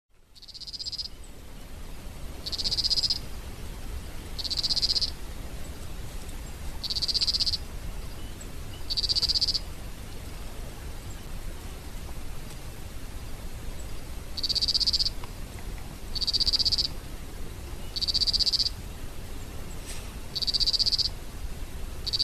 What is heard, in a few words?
Scinax squalirostris